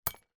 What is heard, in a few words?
impact glass small shard lightbulb hit